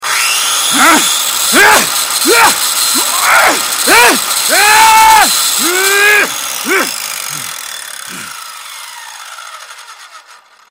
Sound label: cannibal sight; frank